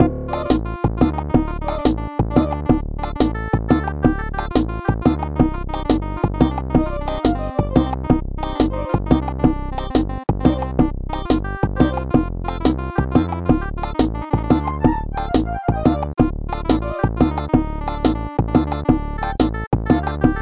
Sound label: arab flutter